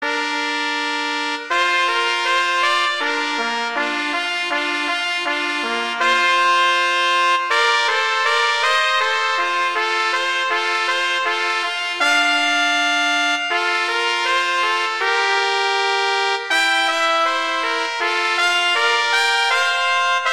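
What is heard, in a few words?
Duet, horn; Mozart; Duets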